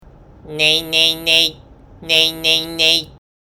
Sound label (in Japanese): Nay 発声